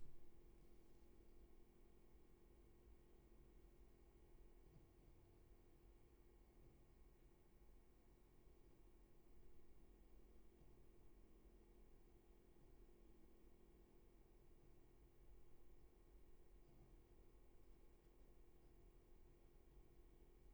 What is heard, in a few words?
room tone